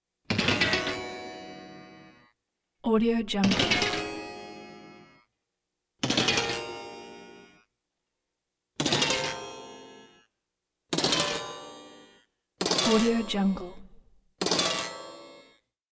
lute out of tune guitar metal string twangs; prosoundfx

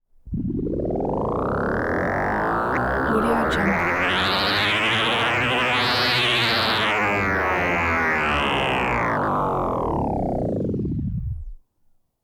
synth throb

